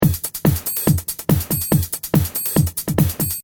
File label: Techno-Trance Drum Loop 142 Bpm